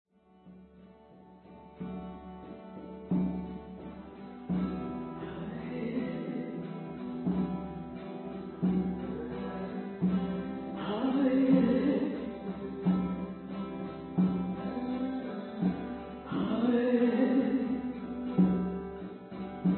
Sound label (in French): Tambour guitare voix